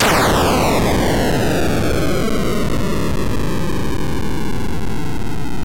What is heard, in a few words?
shoot; defender